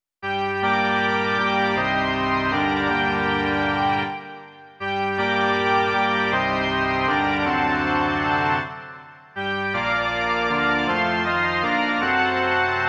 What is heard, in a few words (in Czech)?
varhany